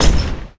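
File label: atk shell throw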